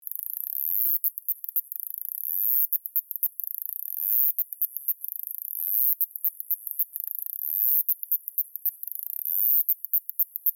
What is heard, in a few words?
cicada; NZ species